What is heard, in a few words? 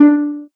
soft hit whistle